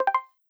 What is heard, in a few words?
Open Or Enable, Modern UI SFX; Slides And Transitions